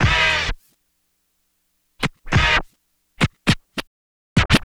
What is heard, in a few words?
Scratching, Vinyl Scratches